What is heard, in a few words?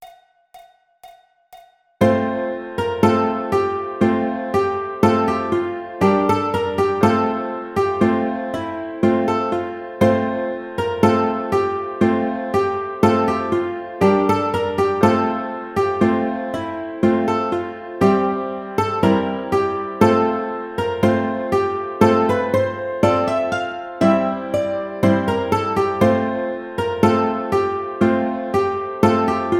Ukulele; Deck The Halls